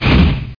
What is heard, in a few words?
swing; Hexen II; golem